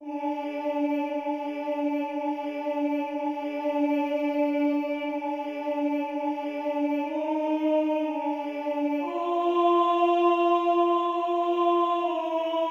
lead

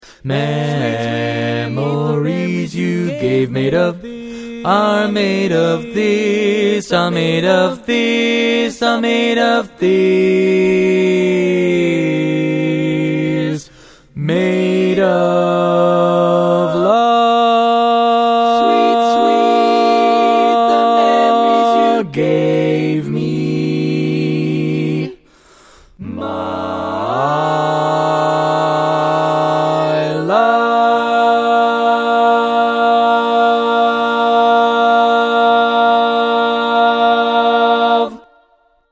Bass, barbershop tags

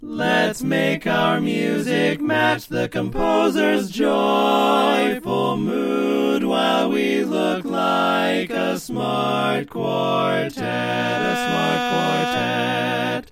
Quartet All Parts, barbershop tags

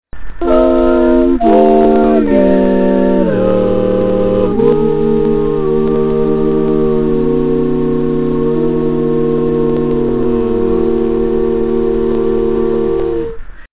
All Parts, barbershop

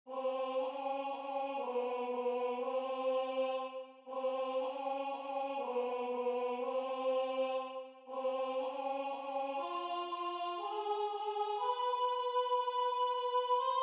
Tenor

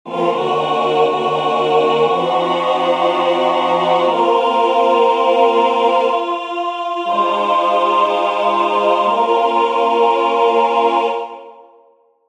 All Parts, barbershop

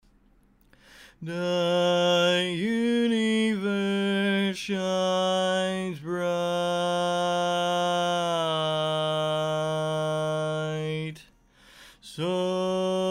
Bass

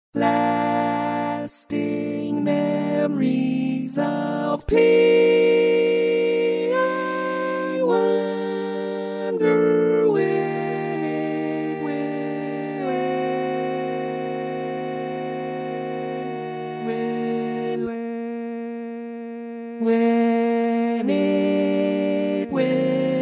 Tenor

